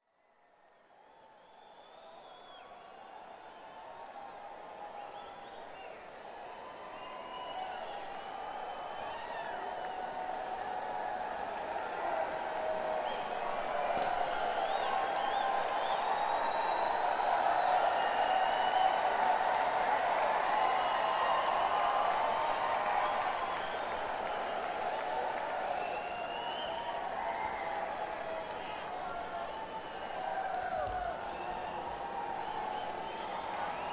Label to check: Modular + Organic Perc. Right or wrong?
Wrong